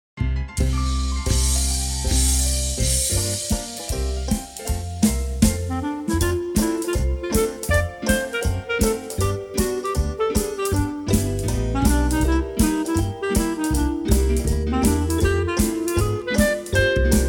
clarinet

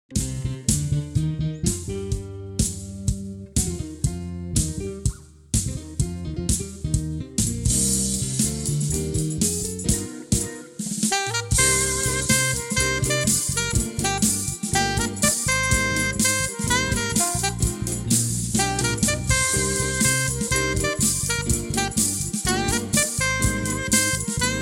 retro groove alto sax

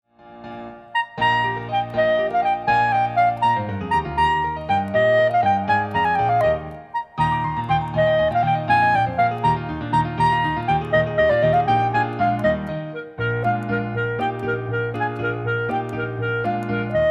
Concerto for clarinet 3rd Movt; Op.501; excerpt

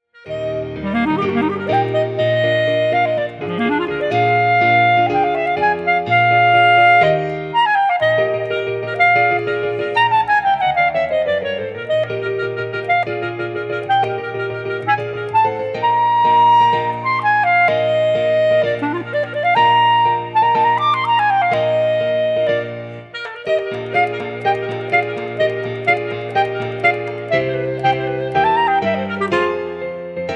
Concerto for Clarinet 1st movt; Op.501; excerpt

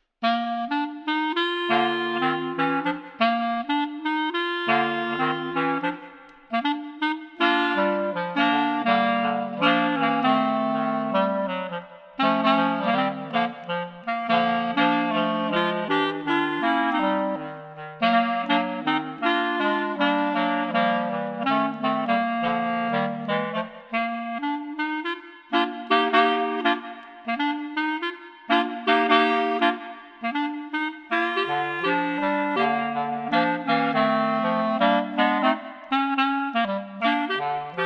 Clarinet Trio

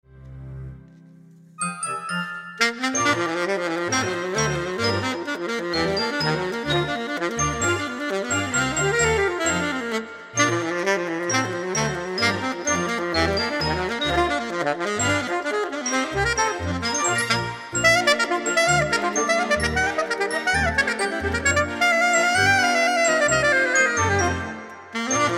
Alto

